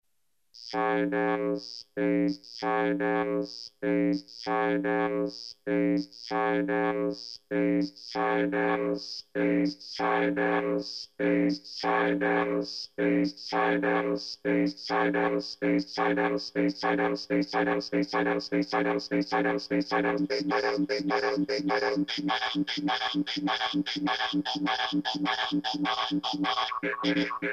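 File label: Vocoder; flame2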